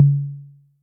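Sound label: Analog Tom, Yamaha DTXpress; Electric Tom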